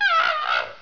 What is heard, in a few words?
monkey